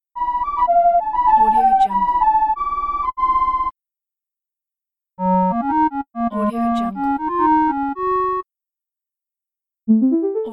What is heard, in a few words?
feelgood level complete